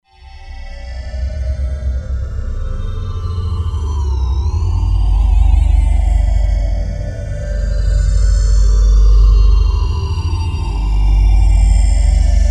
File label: Landing Pad, Roland Fantom XR